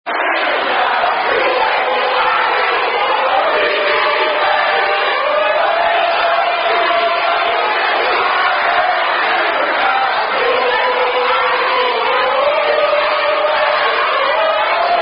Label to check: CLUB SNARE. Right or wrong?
Wrong